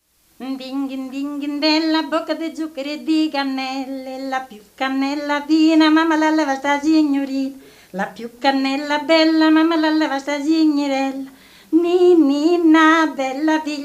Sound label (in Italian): filastrocca; abruzzo